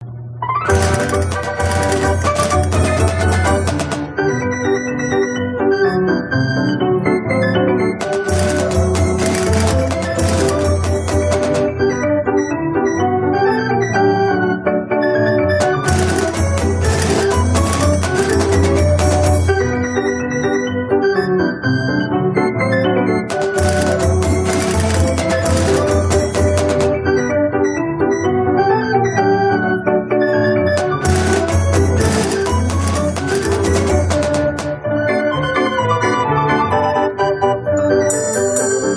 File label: nickel music, band organ music